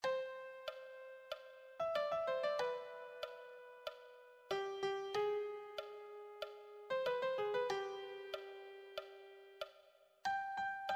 melody

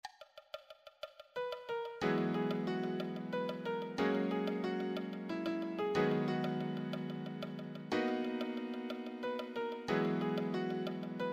solo, piano

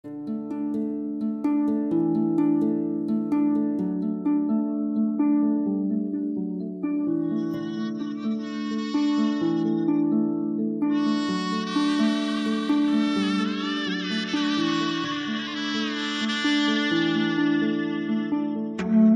backing track

